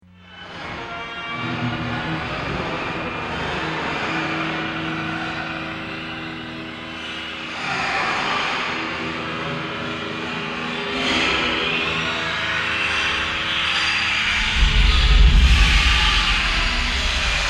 Metal Horror Scraping Builds To Crescendo Boom At End; Metal Impact Foley Small Metallic Bounce Household; Materials; BBFX